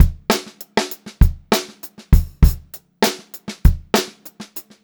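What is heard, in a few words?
STRAIGHT BEAT, Funk-Groove Drumloops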